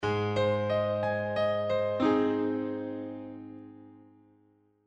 Tonartsgivare G Ab

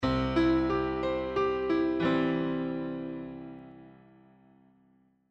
Tonartsgivare C

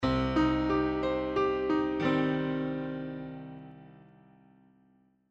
Tonartsgivare Cm

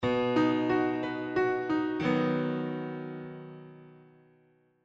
Tonartsgivare B

